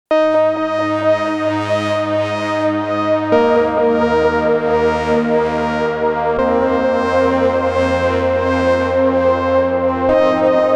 Synth Oil Can; Source Audio Nemesis

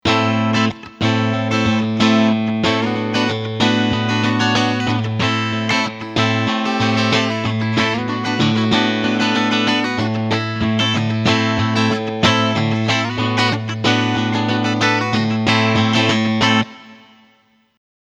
Analog Bridge Humbucker SC Clean

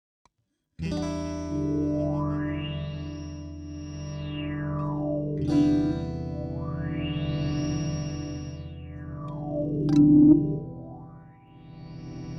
synth B guit Dimension

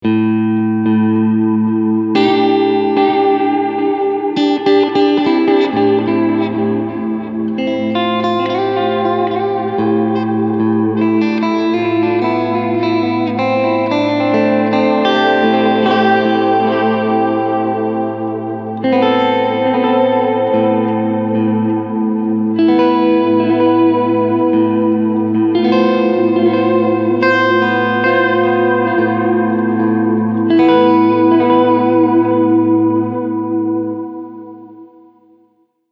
Long Delay Reverb

